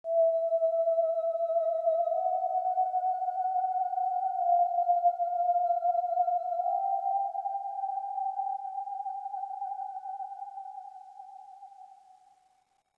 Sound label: Oneshot Synth